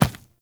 Medium Run Default, Footsteps; Warframe; Infested Footsteps Medium

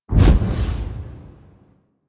Investigate Action; Warframe; Fortuna; Venus

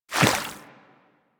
Infested Small Walk Water, Footsteps; Warframe

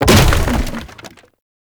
Crate Wood Medium Destroy; Objects; Destructable Wood Crates

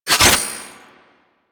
Glaive Recall; Warframe; Tenno; Teshin

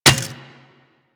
Worker Metal Leg Step Default; Solaris; SolarisWorker; Steps; Warframe

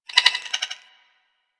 Beep Stutter; Warframe; Ambience; Corpus

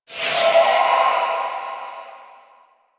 Evolving Weapons UI Node Select; Zariman

